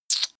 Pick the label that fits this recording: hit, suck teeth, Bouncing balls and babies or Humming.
suck teeth